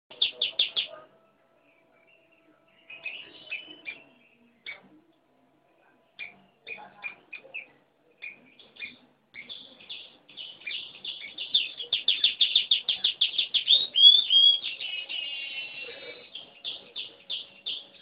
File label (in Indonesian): manyar gacor